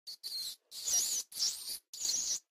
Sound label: rat idle